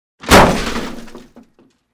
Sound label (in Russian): udar